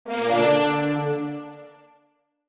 Mobile game nordic vikings alert notification

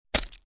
Hitting bloody body

